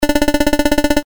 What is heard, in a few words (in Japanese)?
会話